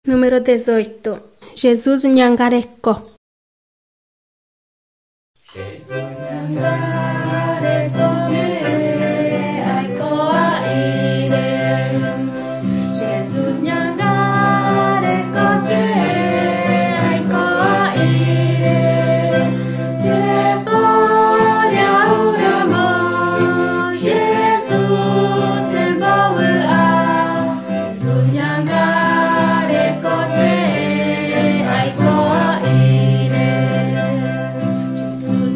songs guarani mbya; bible

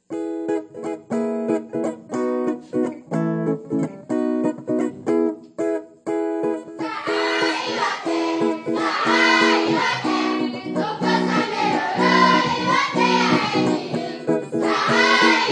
singing to god; guajajara; wycliffe bible translators inc nt drama

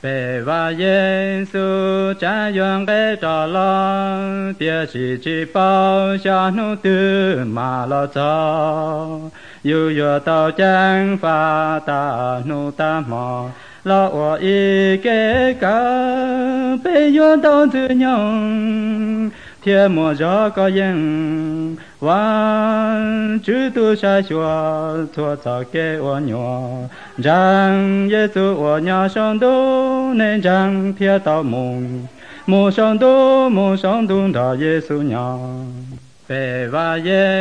songs hmong white

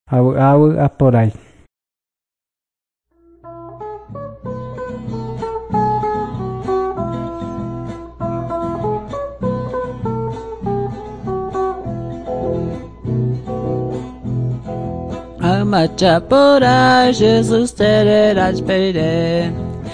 songs guarani mbya; bible

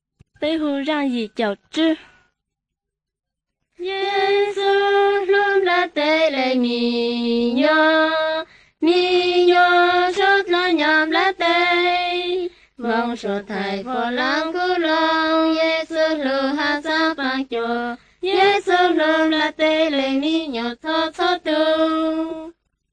songs hmong blue; bible

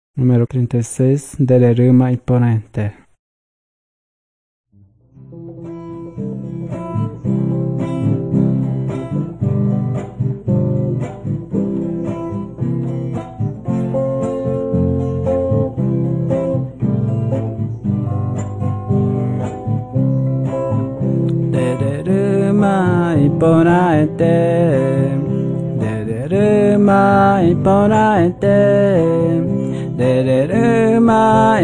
songs guarani mbya; bible

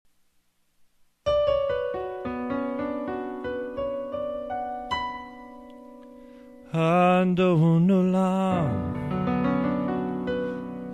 jewish childrens song; hymns

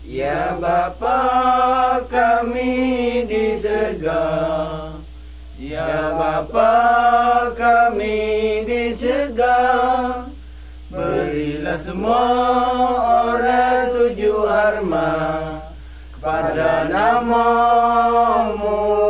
songs malay pattani

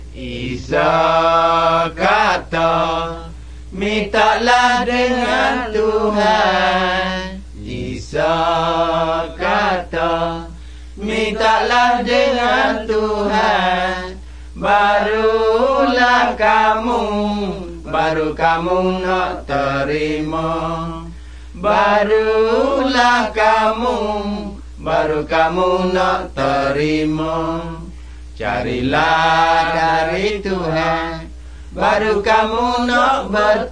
songs malay pattani

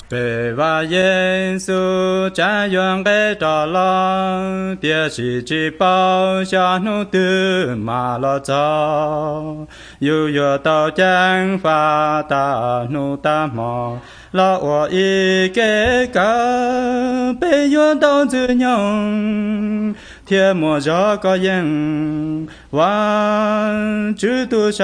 songs hmong white

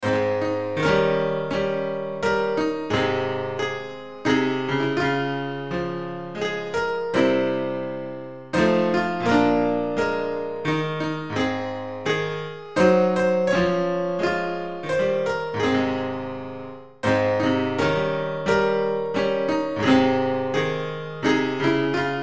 instrumental hymns; jesus calls us

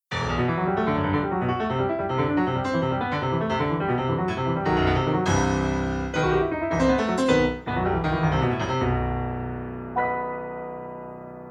piano redemption

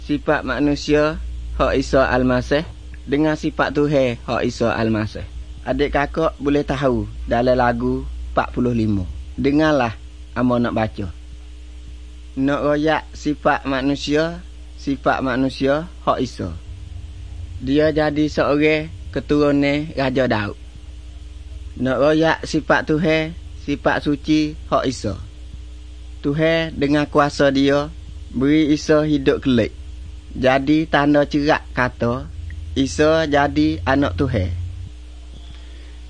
songs malay pattani

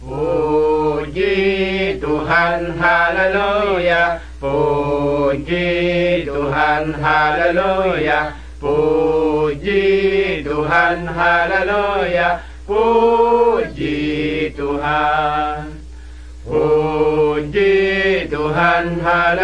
songs malay pattani

